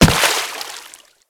Infested Runner Step Water, Footsteps; Warframe

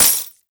Fist Claw Coin Hit; Warframe; Tenno; Incarnon

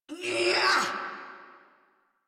Attack Effort, Battle; en; Warframe; Grineer; Catbrow Handler

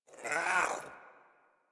Pet Speak; Warframe; Catbrow; Pet Ship

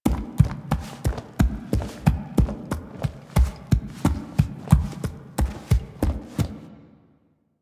Horse Steps Trot Loop Default; Vehicles; Duviri

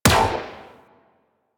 Guard Turret Rifle Fire; Warframe; Venus; Venus Turrets

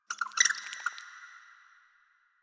Rat Pet Squeak; Warframe; Ambience; Nineteen Ninety Nine

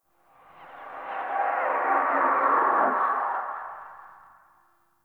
backwards whisper yell; ambience

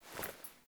Foley Walk, Clothing; Characters; Player

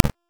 Pause Power Cast; Powers

